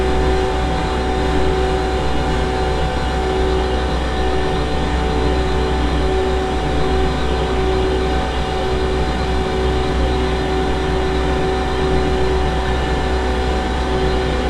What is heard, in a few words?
Dome Panel Hum; Boomtown; Titanfall 2